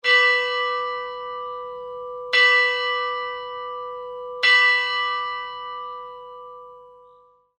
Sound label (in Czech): zvon; augustin; zvonohra tony